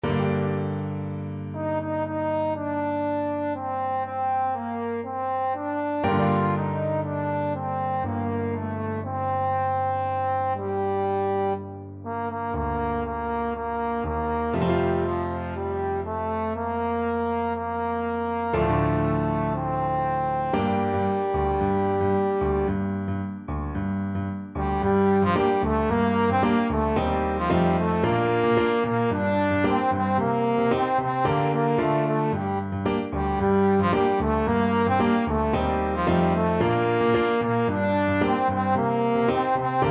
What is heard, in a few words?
scalerica, trombone